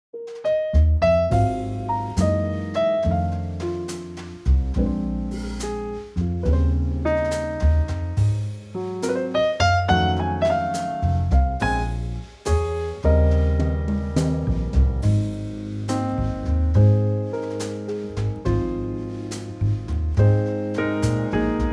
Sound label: Ab Trio